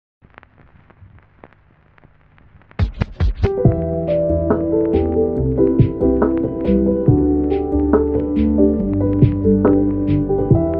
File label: Lofi Piano